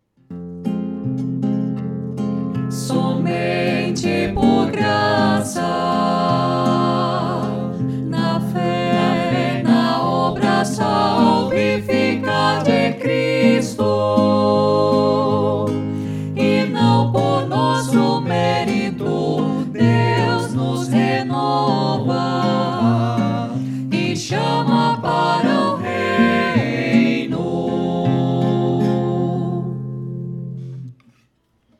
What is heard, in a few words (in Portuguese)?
vozes juntas; Confessamos juntos